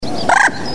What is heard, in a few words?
bird